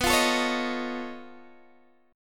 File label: B 9th Flat 5th B down Guitar DADGAD